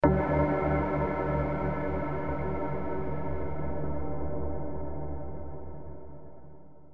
ready check; no focus; ui; stingers; dota

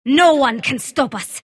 sylph attack emote; dota; dark willow

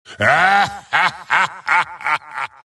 wraith laugh; dota; skeleton king

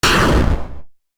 bomb; spoonman; dota; crownfall

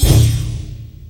illusory orb end; dota; puck

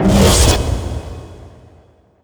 black hole stop; weapons; dota; enigma

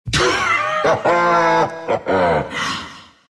dragon laugh, dragon knight; dota

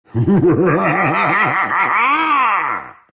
laugh; sandking

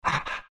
pant big, greevil; courier

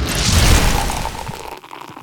brawl crit; weapons; dota; brewmaster

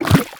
fish flap; ambient; dota

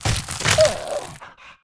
inv egg hatch; ui; inventory

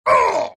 axe pain; dota

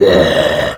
death troll common, creep deaths; dota

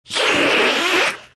vipe laugh; dota; viper

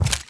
gun pickup; ui; inventory

